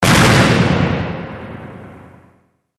Firework Bang

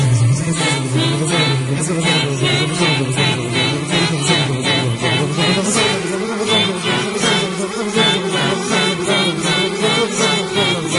clasical

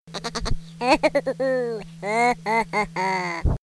funny laugh

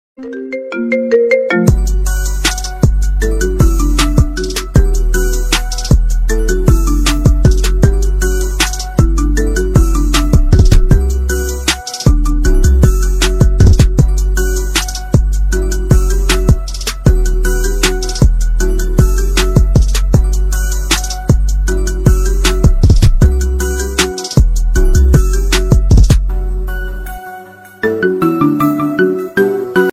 Marimba

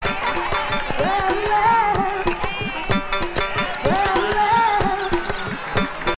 funny tune

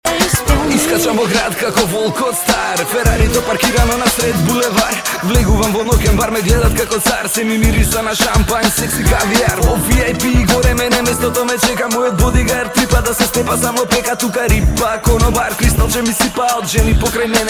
pop

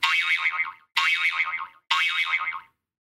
IPhone 12 Boing IOS 14

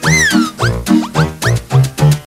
funny looping

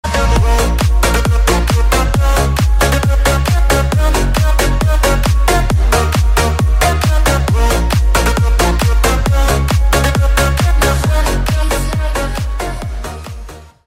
Boombox

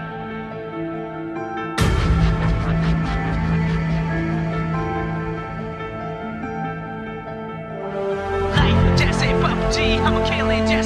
Pubg anthem

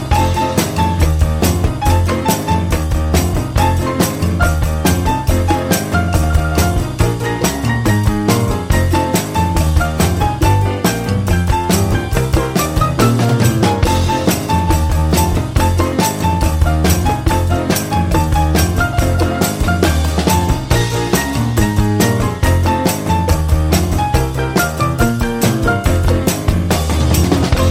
jazzy jingle bells

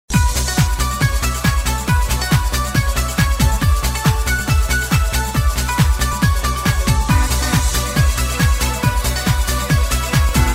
dancing sms

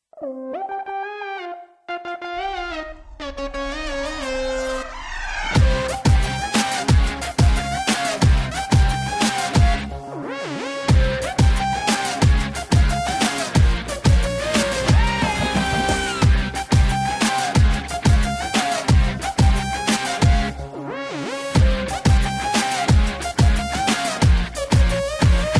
pop

